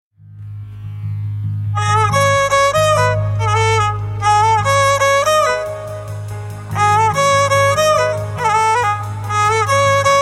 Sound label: Ney